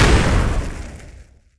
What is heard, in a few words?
fire impact